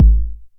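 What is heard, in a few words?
909 BD tape, kick drum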